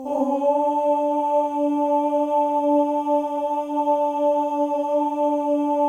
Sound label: male ohs; Spectrasonics; Symphony of Voices